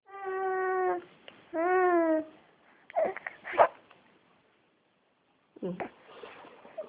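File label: baby hungry, male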